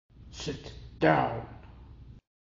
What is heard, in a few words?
Sit down sound effect